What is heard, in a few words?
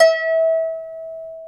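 harp E4, Elizabethan harp; InVision Interactive; Lightware VOL-1; Instruments & Percussions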